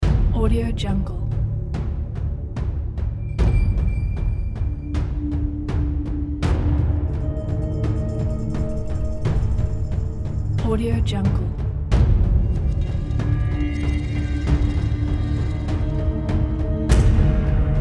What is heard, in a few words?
tension percussion trailer